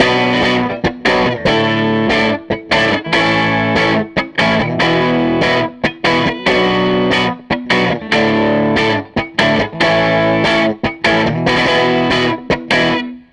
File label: SG Guitar Loop